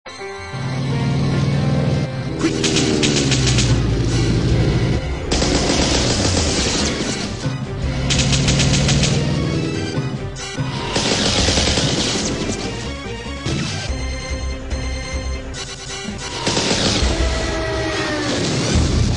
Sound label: dogfight